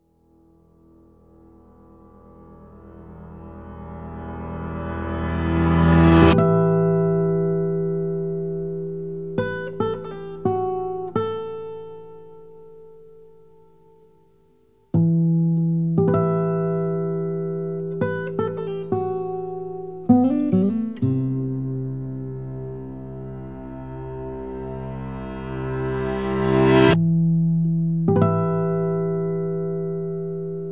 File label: Roundabout Reverse